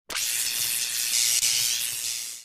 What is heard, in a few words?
tattoo machine spark